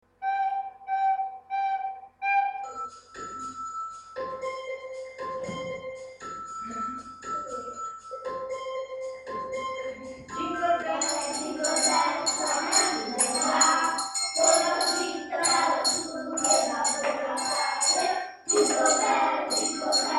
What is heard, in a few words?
jingle bells